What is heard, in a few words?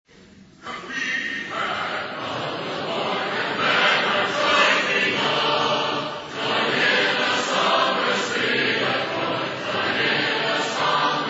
a capella hymn